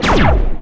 walker small weapon fire; Garry's Mod; omnidroids pack 1

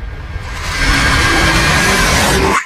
jumpscare; trevor henderson; mshumble

